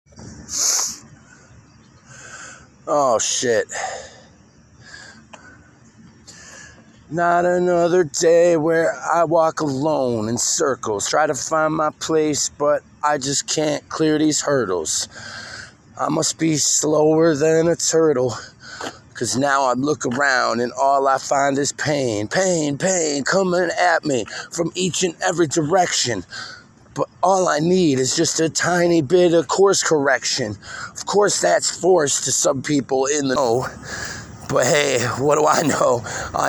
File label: vox, acapellas